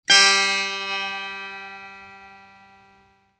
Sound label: setar sol